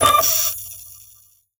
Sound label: Spin Down, DVD Drive Internal; DVD Drives